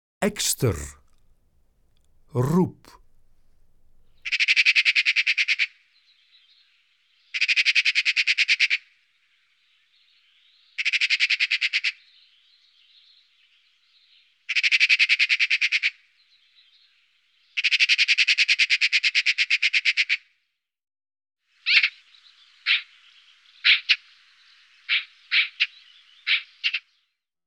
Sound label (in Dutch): Ekster, Zangvogels; IVN